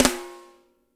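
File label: piccolo snare; Spectrasonics; Burning Grooves CD2; DRY KIT 4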